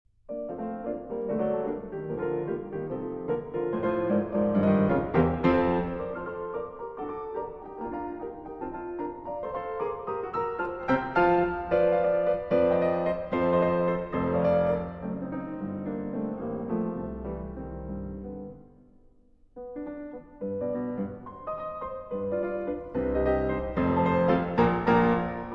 Piano Sonata, B-Flat Major, Scherzo; Beethoven; Piano Sonata No. 29; Op. 106 Hammerklavier; Assai vivace

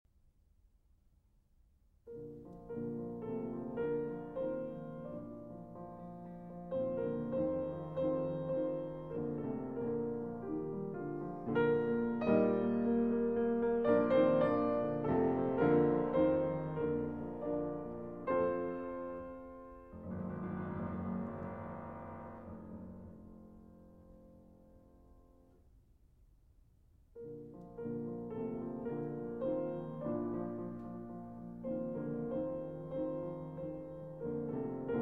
Piano Sonata No. 21 in B-Flat Major; D. 960; Schubert

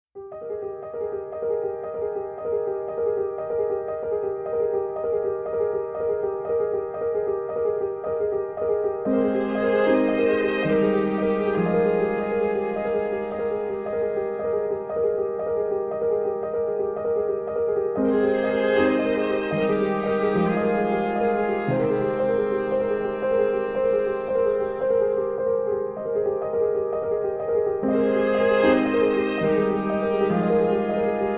String Quartet Version

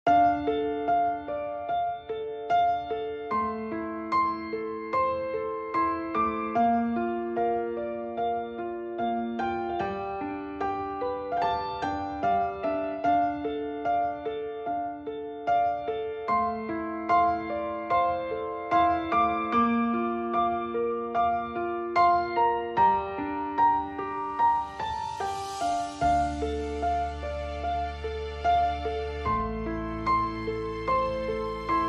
sad piano

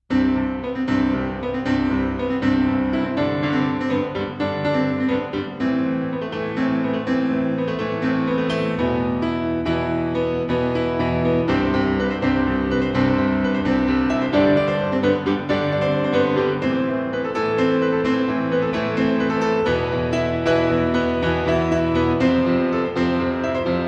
The Piano

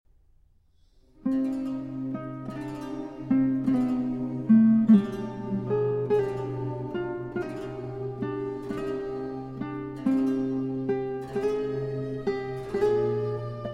Sonata For Lute, Violin and Continuo, Guitar; RV.82; Arr. For Guitar- Pepe Romero

